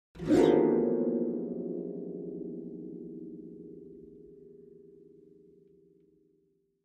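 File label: Harp Fast Short Glissando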